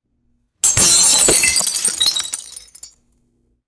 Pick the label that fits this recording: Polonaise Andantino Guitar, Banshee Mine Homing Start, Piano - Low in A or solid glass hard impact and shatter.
solid glass hard impact and shatter